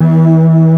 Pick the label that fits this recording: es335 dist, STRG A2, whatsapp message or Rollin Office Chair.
STRG A2